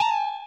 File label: wavelet; tuxpaint; magic